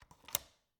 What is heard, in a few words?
Cabin, front door, deadbolt, lock, latch, thick, open, hyper; Eiravaein Works; Vaeyan IV